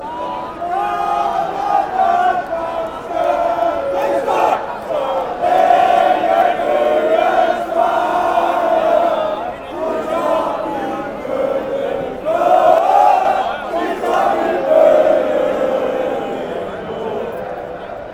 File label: chant; randers